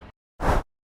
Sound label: change slide sound